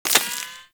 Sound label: Bullet Impact Debris Dirt; Warframe; Weapons